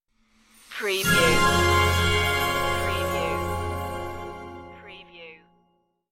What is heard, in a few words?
stinger no choir